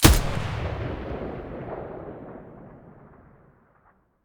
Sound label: mdr muzzle mono, weapons